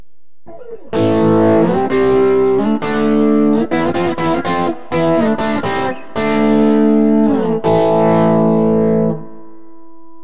jb bridge; burny; fugly clips

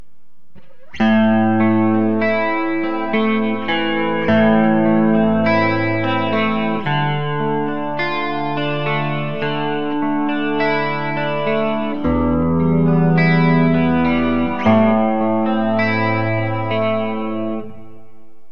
demon neck clean specpick; burny; fugly clips

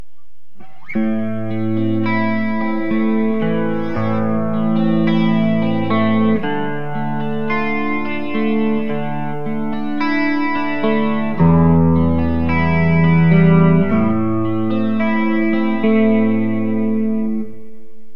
neck clean; seth; burny; fugly clips

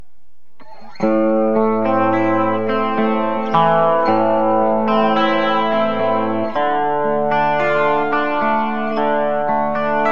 blackout bridge clean specpick; burny; fugly clips

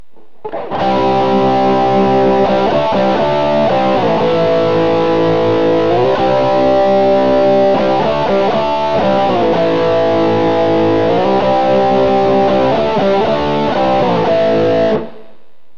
emg81 bridge crunch; burny; fugly clips

